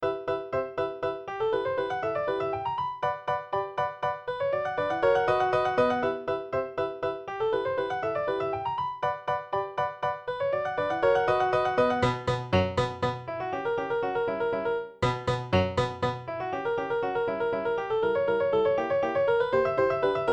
mini grand, Piano; Air Music Tech

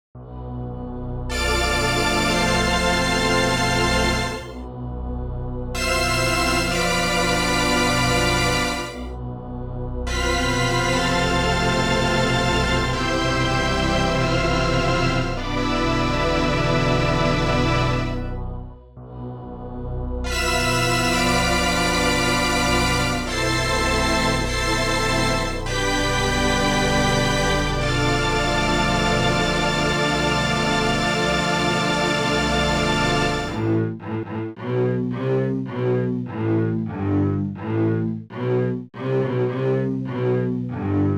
cmi test; arturia; v collection 6

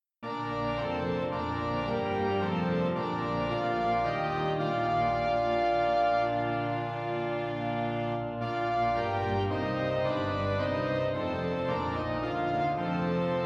organum venezia; virtuelle kirchenorgel; Best Service; V3SOUND; Symphonia Concert Library

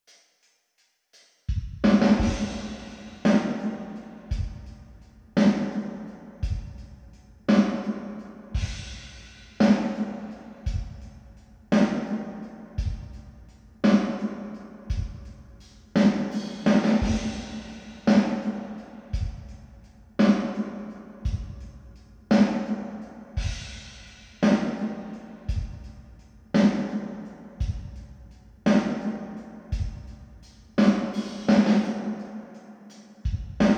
drums akg; halls of fame 3; best service

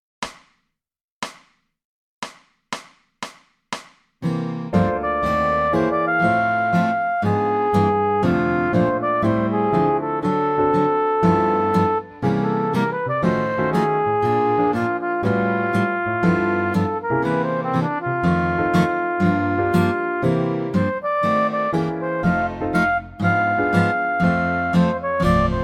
solo flugelhorn; C Music Production; Library für Kontakt

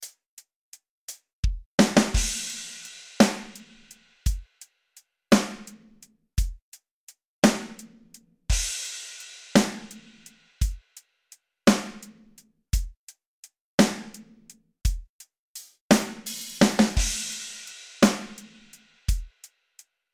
drums dry; halls of fame 3; best service

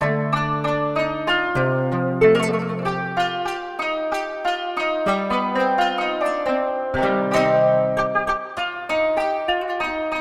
qanun kurdisch; basam ayoub qanun

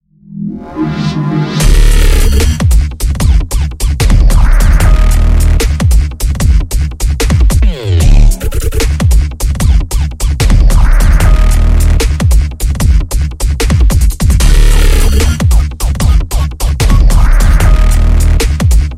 rumble, Rumble Bass Synth; testbericht-rumble-bass-synth-von-uvi

